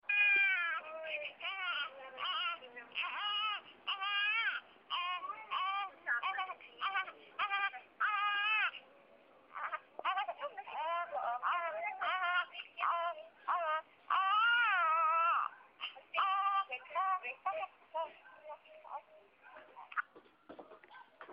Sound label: Week-old Cry